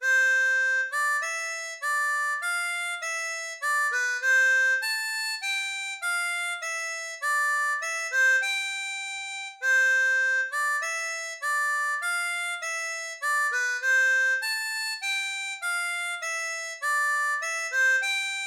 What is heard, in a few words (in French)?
Hymne Allemand, harmonica